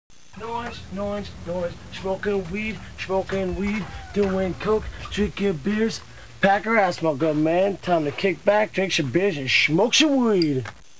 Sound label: chant